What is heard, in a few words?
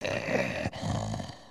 VO sleep, creatures organic